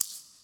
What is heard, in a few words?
Maracas One Shot Faster